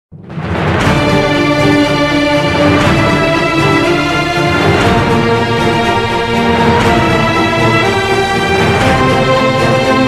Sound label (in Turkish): dizi muzigi tema; emanet 3 sezon; nana yaman tema